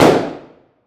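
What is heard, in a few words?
weapon ar fire player atmo interior; gmod; viper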